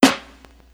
Snare Vinyl, drums; One Shots; Ableton Live 12 Lite; Core Library

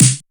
Snare; Ableton Live 12 Lite Core Library

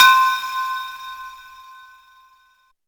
Bell C5; One Shots; Instrument; Mallets

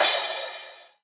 Crash Acoustified High, Cymbal; One Shots; Drums; Ableton Live 12 Lite; Core Library

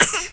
cough; Game Maker 8

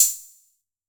Hihat Combo 606

